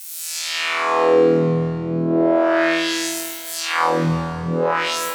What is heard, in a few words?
SFX Laser Beam 140 (E); One-Shots; Ghosthack; Color Bass Essentials